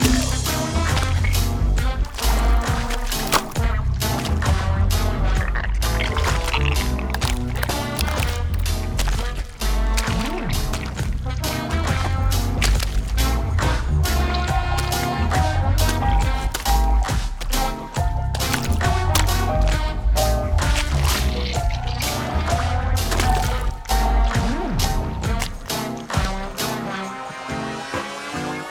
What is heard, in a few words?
Msc Zombie tag Results Loop